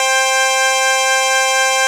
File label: ORIENT C4; CMP - Analogon